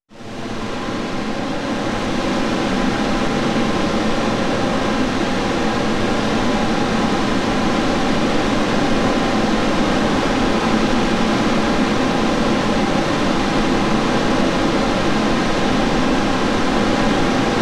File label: White Noise